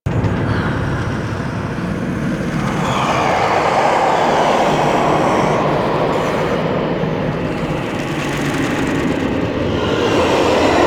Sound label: Tomb Interior Amb; Mummy Tomb